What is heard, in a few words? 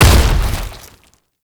Tank Infested Step; Enemies; Movement; Lasrian Tank; Warframe